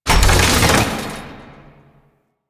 Customization UI Open; Veilbreaker; Kahl

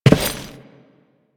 Step Default, Footsteps

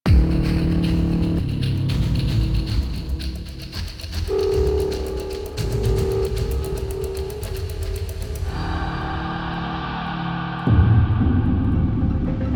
Cinematics Music; Warframe; New War

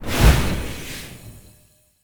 Soul Steal Activate; Powers

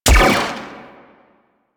Sniper Rifle Shot; Warframe; Weapons; Corpus

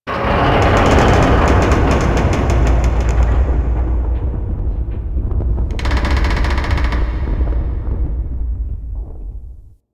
Base Large Metal Creak H; Warframe; Ambience; Star Base Intro

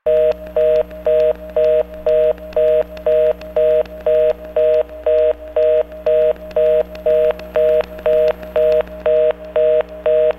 Phone Off The Hook Hub Loop; Warframe; Ambience; Nineteen Ninety Nine

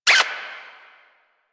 Necramech Repair Drone Idle Short; Warframe

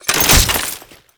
Exalted Sniper Swap From; DE; Warframe; Warframes; Frumentarius

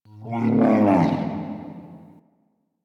Interior Moan Gurgle; Ambience; Misc Indoor; DE; Warframe; Infested Eris

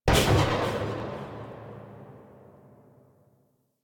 Spark Rifle Tail; Grineer

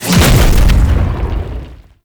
Archon Whip Ranged Attack Proj Explosion; Enemies; Weapons; Warframe; The New War; Nira

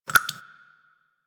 Water Drips; Warframe; Quests; The New War; Ambience; Zariman

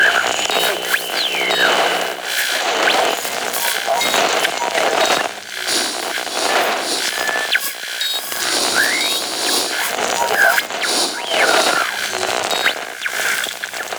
Camp Radio Console Loop; Quests; Veilbreaker; Kahl Camp

